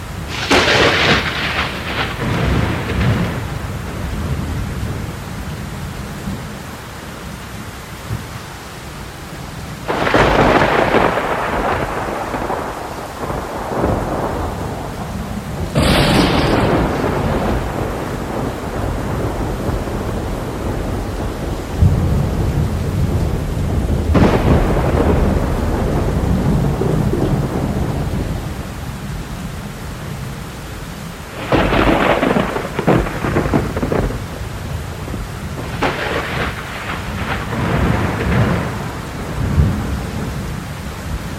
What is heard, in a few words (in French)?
Orage pluie loop; Orages